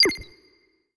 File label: beep; sentinel